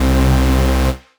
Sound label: Bass C, Offbeat Bass